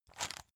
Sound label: pepper mill soft; gastronomie; soundpool